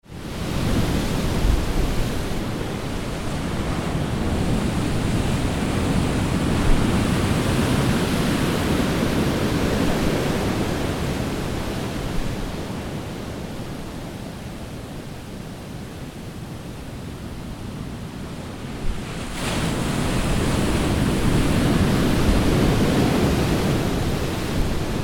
surf

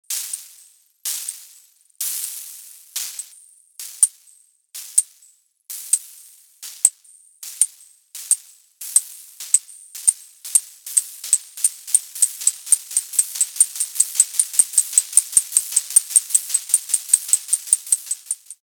shaker; synthesized versions of various kinds / of shakers; Shaker-Pulser

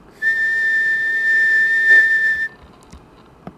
whistle

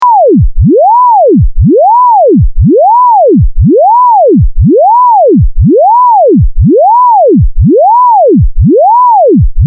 vib

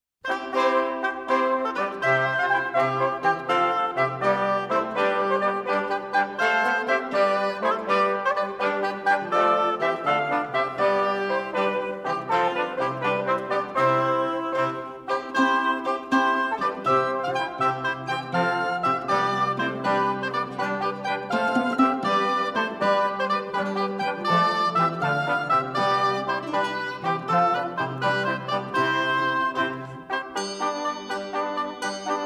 courante

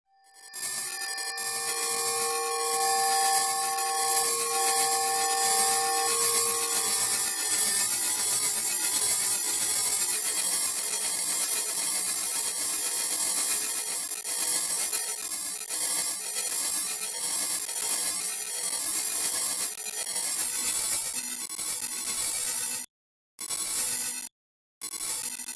bugs; RTcmix FMINST. instrument

